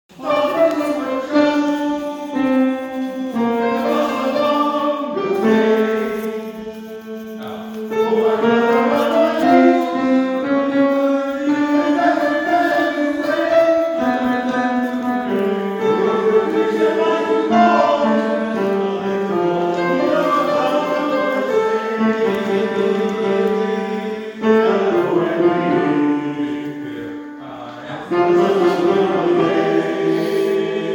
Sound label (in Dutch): Tenor Bas